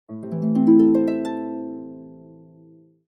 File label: harp normal